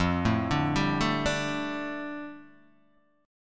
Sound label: F 6th Add 9th F down Guitar Open D, chord